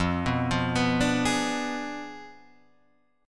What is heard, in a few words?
F Diminished 7th F down Guitar Standard; Fdim7 arpeggiated; JGuitar